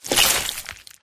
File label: human form short move; flood